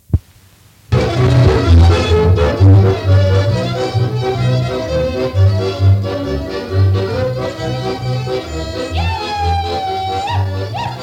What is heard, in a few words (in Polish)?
Instrumentalny; Nagranie archiwalne